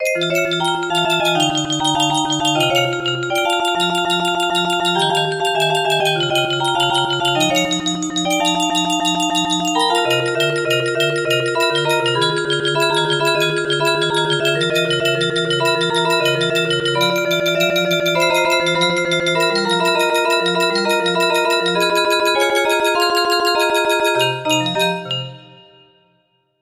xylophone, music box melody

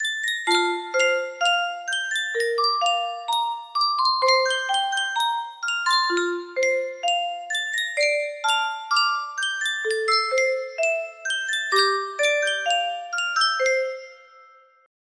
sankyo custom tune music box, music box melody

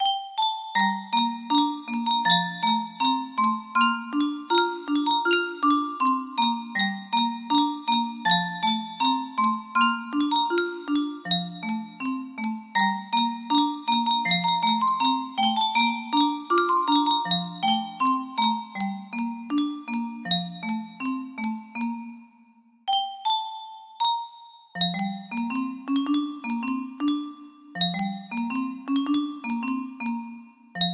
melody, music box

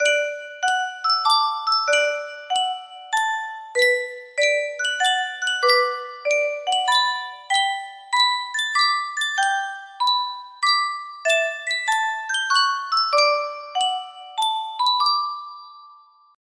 Yunsheng custom tune music box

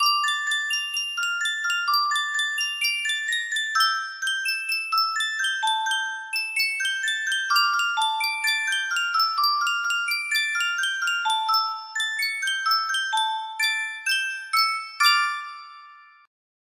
cuendet music box

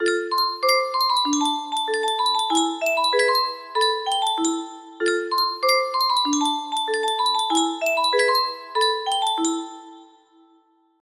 yunsheng tune music box, music box melody

